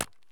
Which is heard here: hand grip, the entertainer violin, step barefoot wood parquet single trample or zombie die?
hand grip